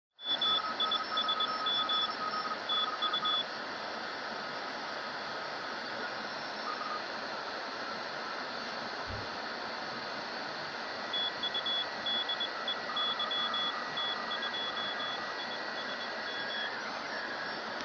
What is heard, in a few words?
regen